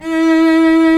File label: E3 CELLO; SOLO; Instruments & Percussions; InVision Interactive; Lightware VOL-1